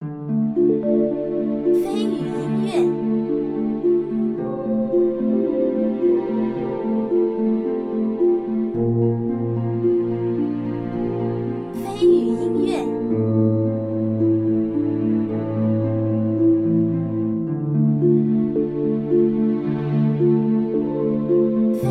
空灵 唯美 安静

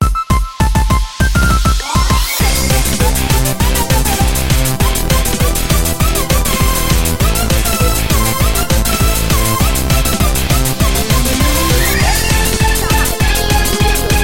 欢快-休闲; 赛车

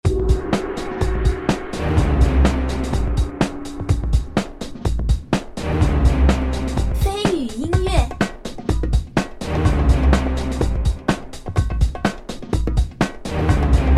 紧张 危险 诡异

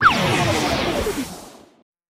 失败

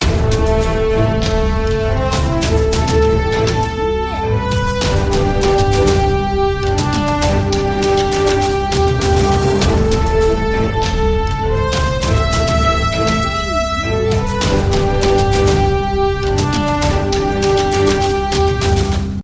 登录音乐

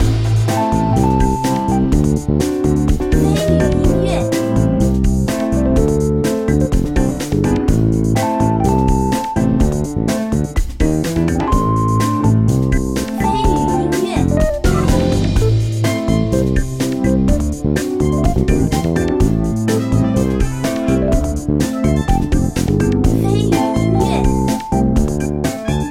背景音乐

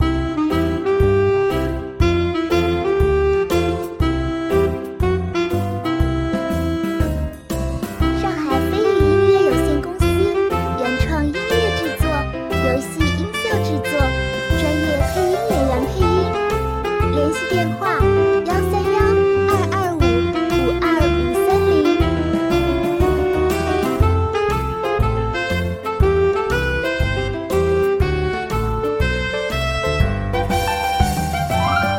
爵士休闲; 豆子世界

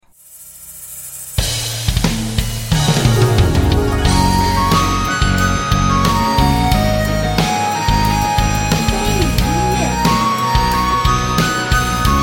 创建登录-中国风-摇滚

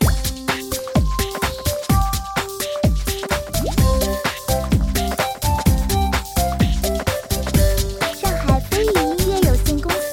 电子Q版节奏; 森林

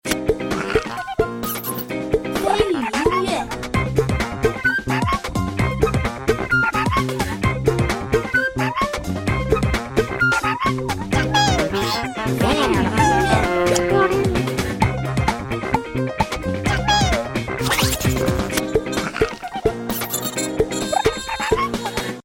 欢快 活泼 可爱

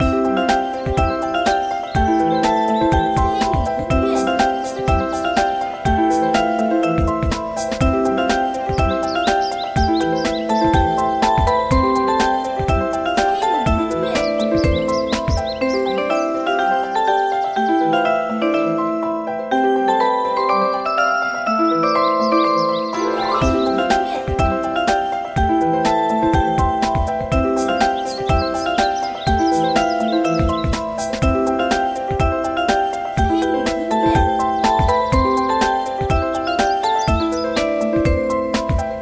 轻松-活泼-愉悦

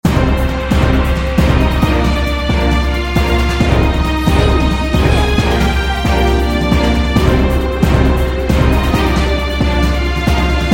恢宏 磅礴 激烈

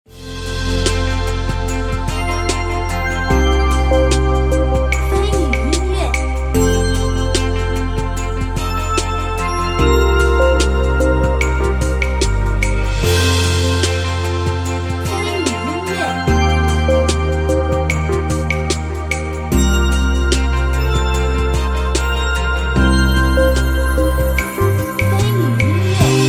天空

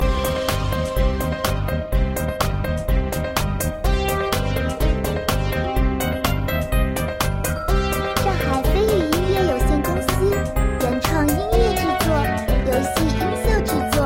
日系Q版可爱; 阿喵阿汪; 战斗

